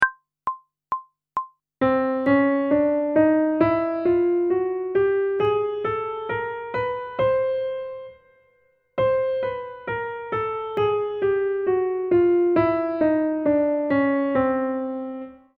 Chromatic Scale one octave ascending and descending, pop vocals; grade 7; Technical Work